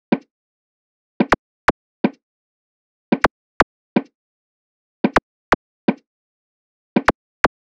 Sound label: Drum Loop - 125 BPM Percussion; Loop Stems; Cymatics; Fever Dream Drum Loop